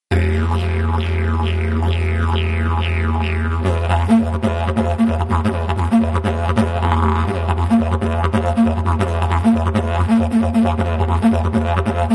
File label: E-Funk